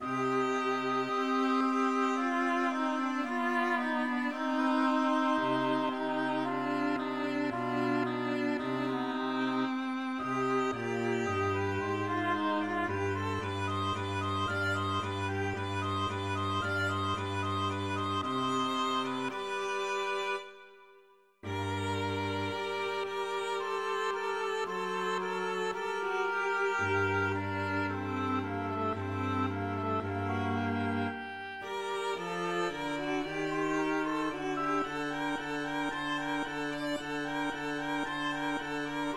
Trio Sonata in C Minor Adagio; Telemann; Mvmt 1

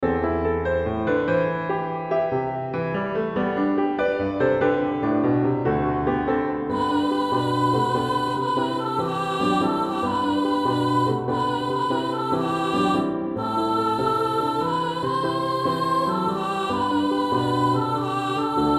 piano vocal; our father

